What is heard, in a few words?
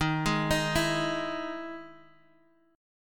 E Major 7th Eb